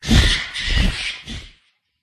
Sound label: bat attack voice bottom